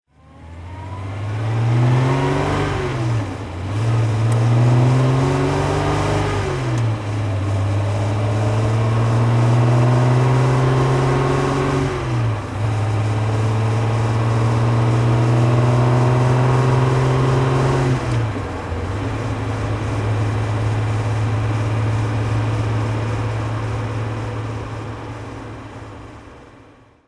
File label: healey 3000 mk2a drive